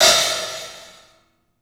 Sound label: HAT, CLUBHAT, 3D Drums; 3D Sound